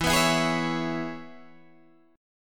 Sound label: E 6th, down, Guitar, Open D